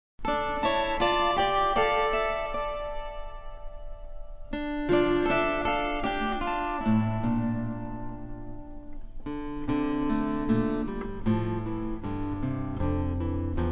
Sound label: Guitar Trio God Rest Ye Merry Gentlemen